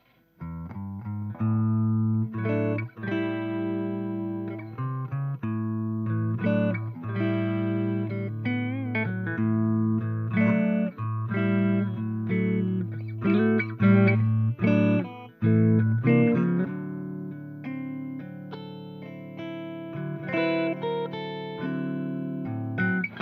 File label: IT 11 Deluxe Clean crunch Les Paul